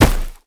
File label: Pirate Barrel; gamerip